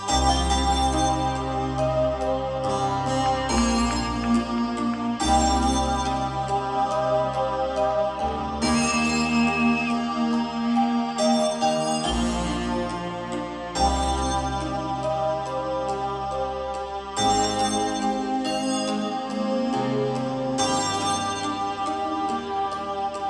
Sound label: instrumental